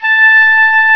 flute A5; Star Trek JR Audio

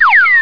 put down; pardon95

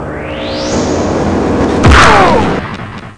zap

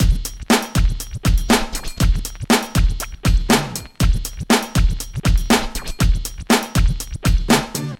beat; Sonic Foundry; mac money rb 101

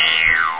spring; lem revenge

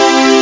insp chord

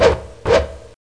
X SPIN

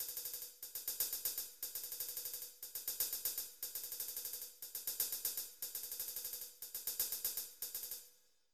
HIHATS; TWIDDLY

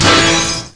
Chop

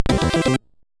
Jingles, SIDTunes

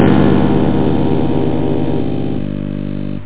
Clav Min6

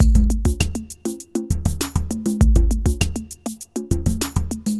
Acid Jazz Drums; syntonicgenerator

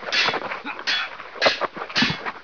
glad pit; Caesar3

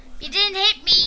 missed; 3D Game Programming All in One

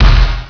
whip crack; BabySmash 5.4

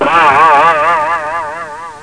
wrong; Etch A Sketch Quick Tour

